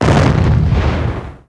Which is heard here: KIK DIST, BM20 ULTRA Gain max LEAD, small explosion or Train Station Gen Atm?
small explosion